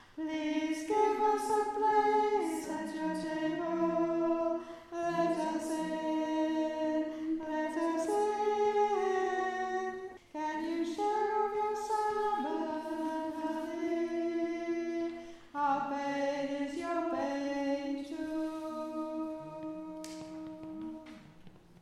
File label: let Us In Top Line